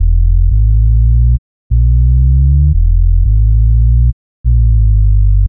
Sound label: 175 A BEND UP LOOP